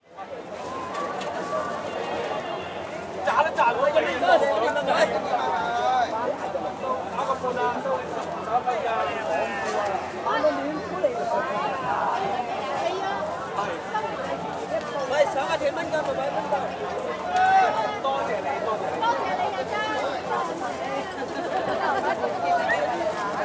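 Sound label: Hong Kong City ambience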